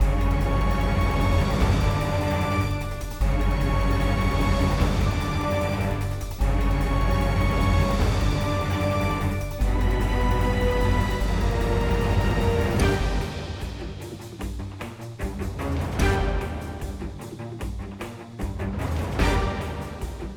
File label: Music Exciting